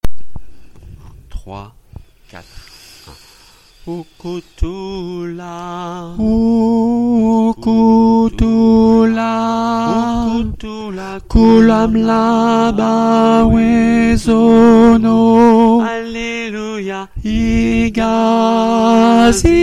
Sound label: tenor, chorale; Ukuthula